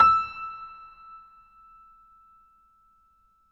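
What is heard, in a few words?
Acoustic Piano, Left; InVision Interactive; Miroslav Vitous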